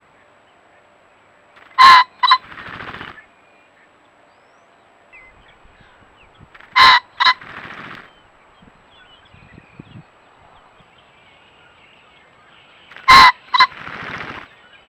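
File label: evening calls, pheasant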